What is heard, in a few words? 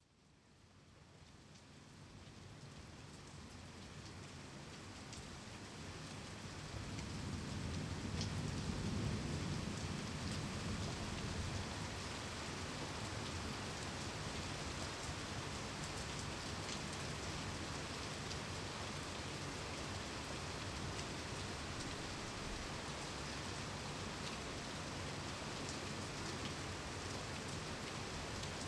Beautiful Calming Rain With Thunder; For Studying Or Deep Sleep